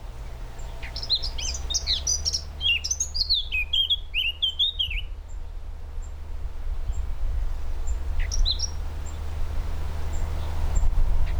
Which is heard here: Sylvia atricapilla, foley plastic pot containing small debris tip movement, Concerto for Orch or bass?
Sylvia atricapilla